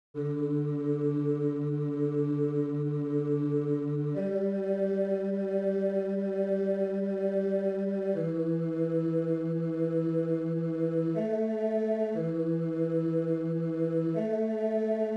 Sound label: Bas; Monteverdi; Vespro; verset 7